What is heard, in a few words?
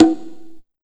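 127 drum 2 L, Fresh Disco House; USB Soundscan vol.20; AKAI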